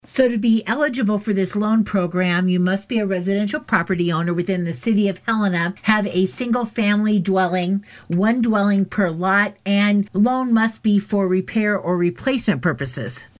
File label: Pipes